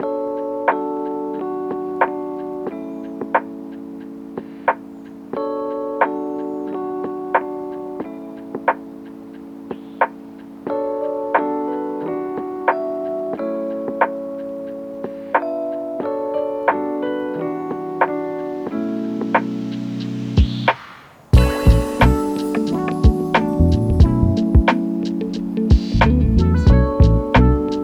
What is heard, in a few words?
Beats